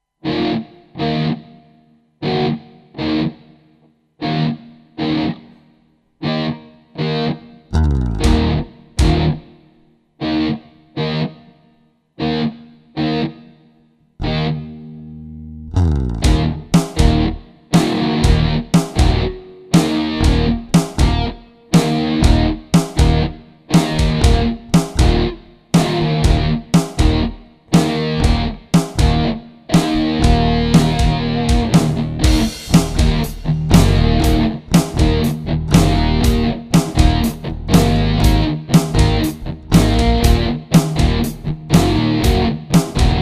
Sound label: Rock